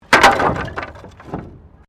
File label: Wood Fall